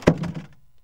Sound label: BOX RATTL; Ambient Sounds; Box Noises; E-MU Producer Series Vol. 3; Hollywood Sound Effects